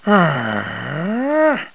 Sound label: Sigh; Niko sighing